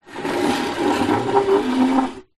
household chair small wooden drag scrape squeak hard ground